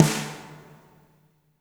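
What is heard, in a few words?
reverb snare right; Spectrasonics; Burning Grooves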